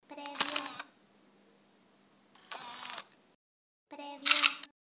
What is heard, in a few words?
Camera On Off Shutter